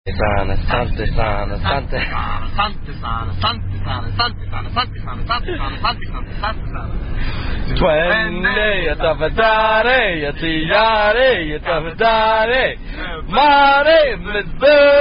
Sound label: Swahili Drinking Song